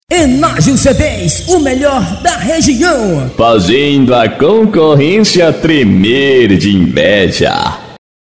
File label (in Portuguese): forro balance e dance